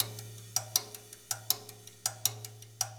Russ Loop, Laidback

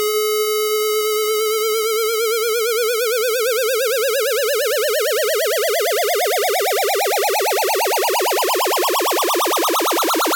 Riser; neuro; InsideInfo

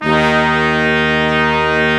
BRS, Quintet long; Roland L-CD702

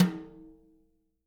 BRUSHES, Living Drums

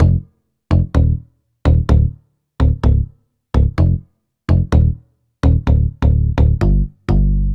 Rubber Bass; Dr. Fink's Funk Factory

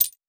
2 layers 2 percussions

